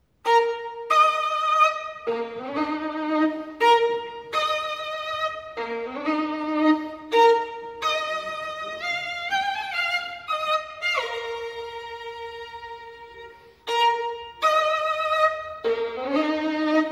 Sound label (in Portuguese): Violino